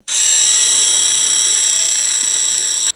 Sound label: small toy car motor whirring